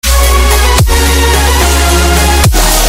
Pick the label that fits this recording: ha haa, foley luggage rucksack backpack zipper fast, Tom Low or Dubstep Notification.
Dubstep Notification